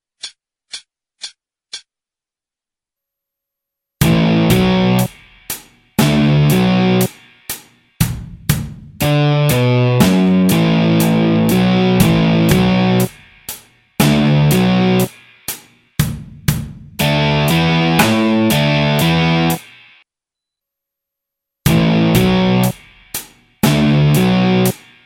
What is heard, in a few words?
solo backing 60